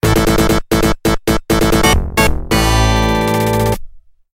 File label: sting; Heavy Burger